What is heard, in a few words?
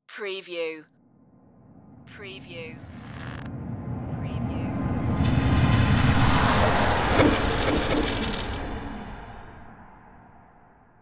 power glitch flyby